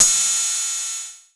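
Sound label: Roland Tr 606 Hat Open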